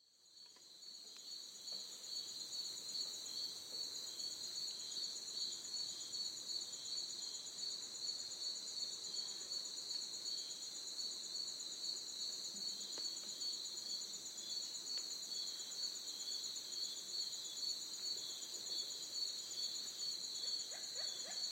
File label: cricket jungle night; ambient